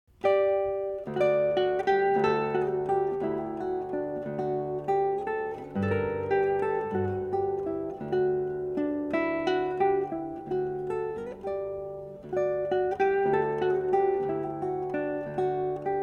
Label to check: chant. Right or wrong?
Wrong